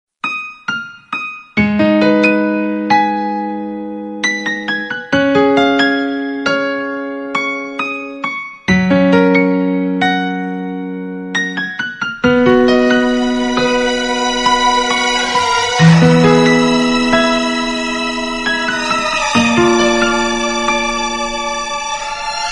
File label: melodious classical soft music tone ringtone; iphone ringtones